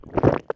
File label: Gulp Hard Swallow